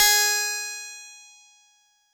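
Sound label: Harpsichord DX100 G sharp 0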